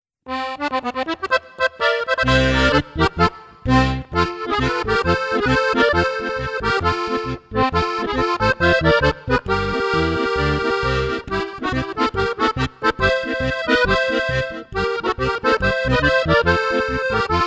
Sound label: Oberkrainer